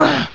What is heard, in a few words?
hurt